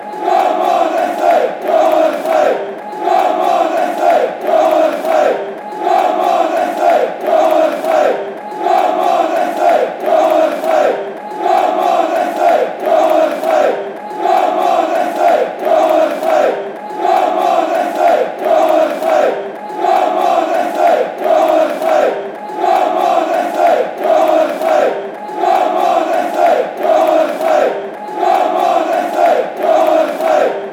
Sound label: fanchants